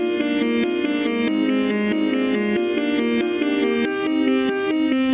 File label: piano reverse